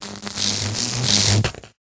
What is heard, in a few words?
Shock